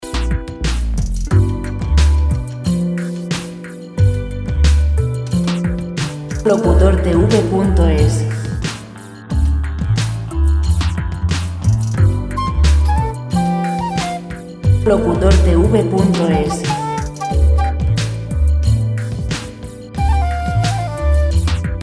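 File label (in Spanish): 90 lento; relajante musica libre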